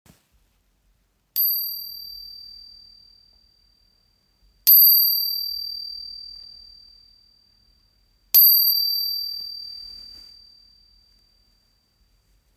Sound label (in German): Mini-Glocke